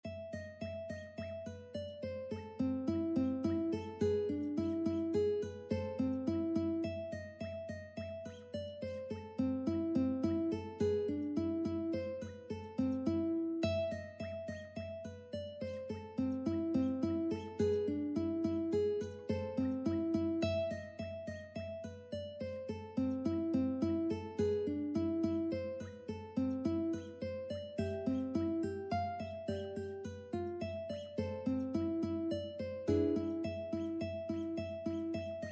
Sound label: uke fur elise; ukebook